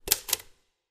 newsroom menu subtle

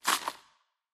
winter tile drop

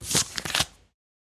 wood tile flip back

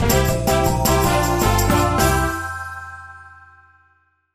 gameshow quiz bonus start

